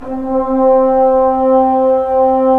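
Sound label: brass trombones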